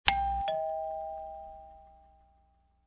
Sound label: doorbell